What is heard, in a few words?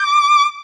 violin